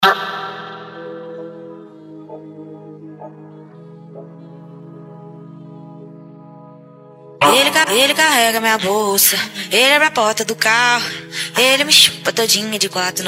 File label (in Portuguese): esp funk mega bass